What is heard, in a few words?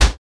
Cabinet Door Slam Di